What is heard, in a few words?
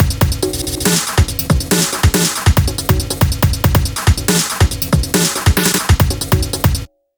drum loop, Dubstep; Dubstep Sample Pack